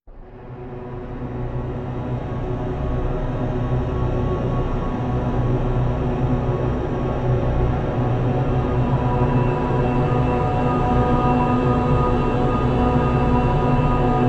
landing, drones, music ambience; The Swapper

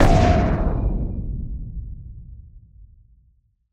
ball hit massive; CrossCode; battle

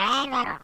mumble; World Of Goo

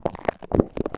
machine crusher rock melting; The Swapper

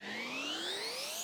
parrot gun windup; battle

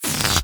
deflate, balloon; World Of Goo

